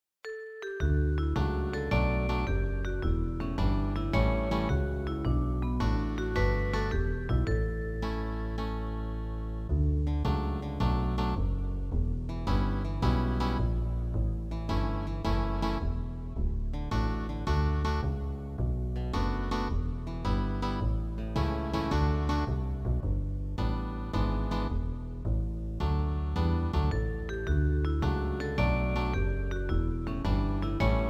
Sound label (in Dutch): lied karaoke versie; muziek onze stad groep 1 2